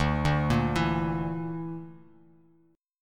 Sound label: D Suspended 2nd Sharp 5th, Bass Standard, chord; Dsus2#5; arpeggiated; JGuitar